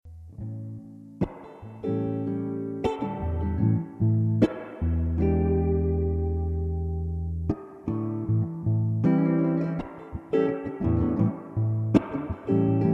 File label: Funky Modal Blues, Guitar